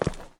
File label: Rock; lethal company